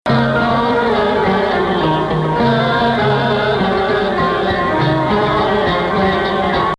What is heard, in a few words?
Rast Ya Shadi Rast; muwashshah in Rast